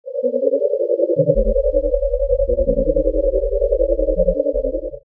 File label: Sfx UFO; nyan cat lost in space